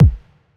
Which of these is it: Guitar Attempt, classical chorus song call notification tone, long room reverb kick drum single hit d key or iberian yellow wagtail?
long room reverb kick drum single hit d key